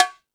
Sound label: Jungel Percussion, E-MU Pro-Cussion; drum machine